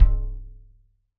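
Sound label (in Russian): соло на барабанах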